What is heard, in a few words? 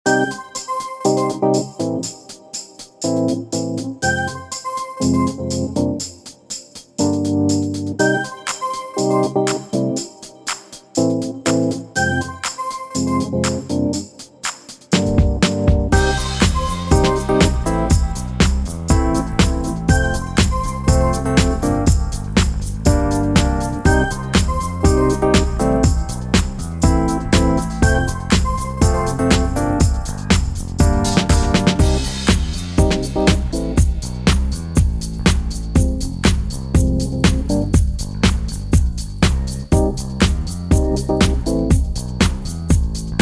Key of Cm, backing tracks